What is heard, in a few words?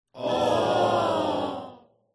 game defeat